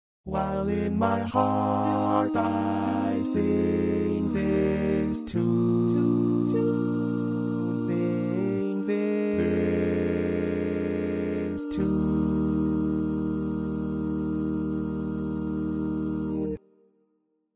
bass

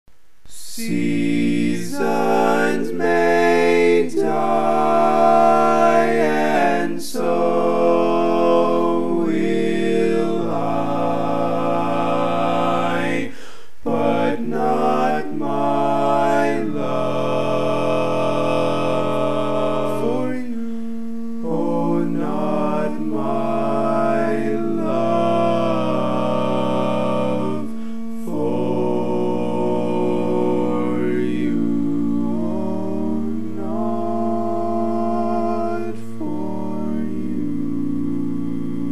All Parts, barbershop tags

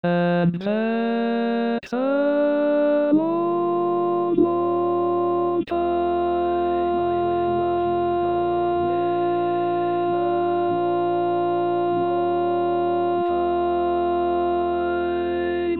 lower Tenor, barbershop tags

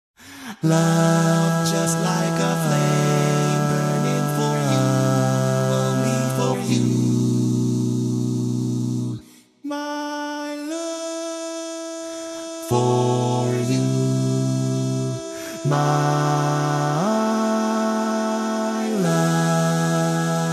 Bass, barbershop tags

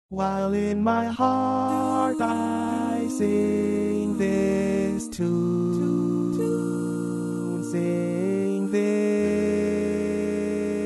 Lead, barbershop

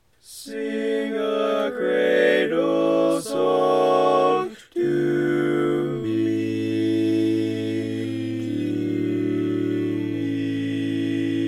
Bass, barbershop tags

